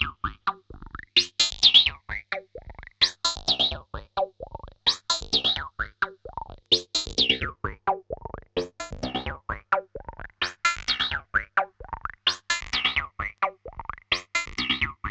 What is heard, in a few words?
EMS LOOP; ELEC-LOOPS; Syntec; Wall of Sounds; TECHNO-BOX